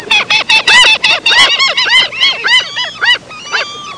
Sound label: Penguins sound